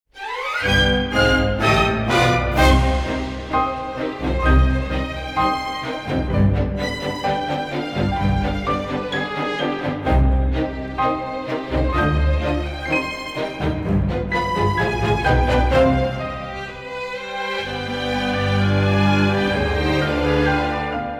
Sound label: Aria [instrumental]; Violet Evergarden ~Automemories~; The Songstress